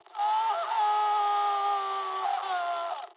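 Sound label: aircraft destroyed scream, speech japanese; War Thunder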